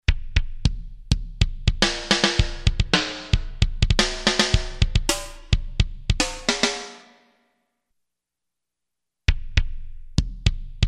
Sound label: Voices, Yamaha S5502 Drums